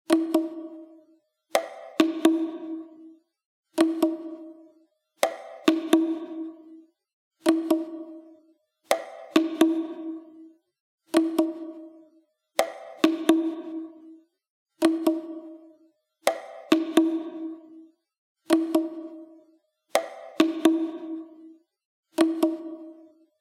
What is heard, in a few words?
ringtone drum; Mudita Pure